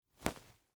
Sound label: Short Sleeve Snap, Foley, Clothes, Short, Sleeve, Shirt, Pocket, Snap, Button; Unbutton; Dressing; BlastWave